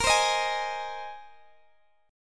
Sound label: A sharp 7th Suspended 2nd Suspended 4th, Guitar DADGAD chord; x,x,20,17,18,18; down